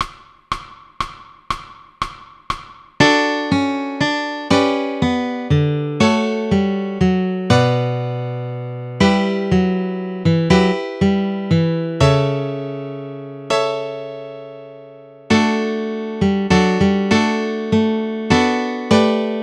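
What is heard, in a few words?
Guitar Melody Chord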